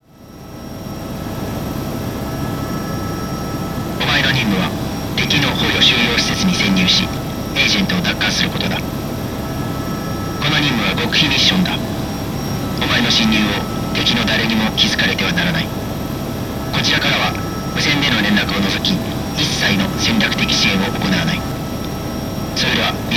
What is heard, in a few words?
takeoff